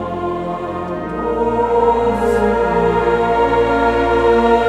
Chorus & Bass Solo

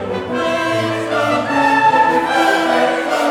Chorus & Alto Solo; INTERLUDIUM - Scena

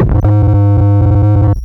Raw Glitch

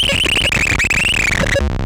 Raw Glitch

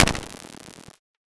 Snare

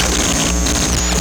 Raw Glitch

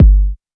vee bassdrum

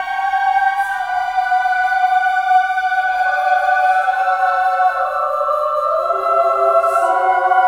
Chorus

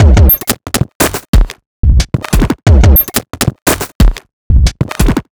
loop 90bpm

